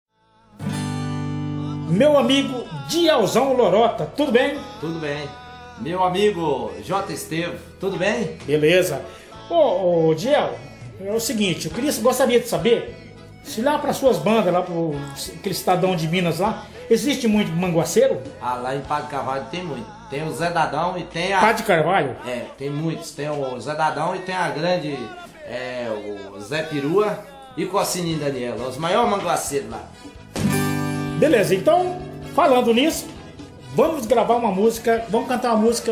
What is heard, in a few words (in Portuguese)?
abertura